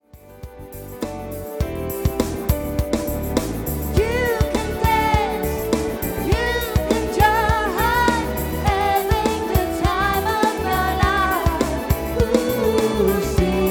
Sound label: Duet